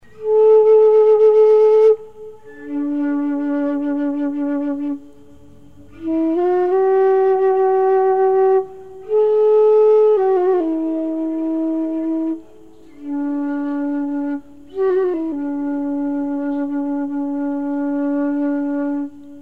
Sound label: Bamboo Flute D